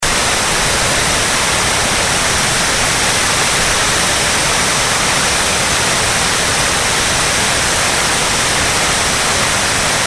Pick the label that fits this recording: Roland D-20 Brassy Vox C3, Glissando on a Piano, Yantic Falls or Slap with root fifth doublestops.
Yantic Falls